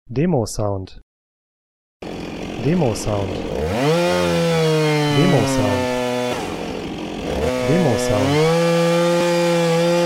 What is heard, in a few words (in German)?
Kettensaege